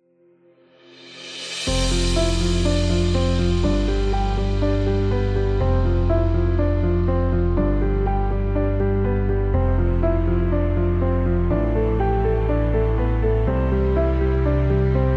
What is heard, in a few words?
Motivational; technology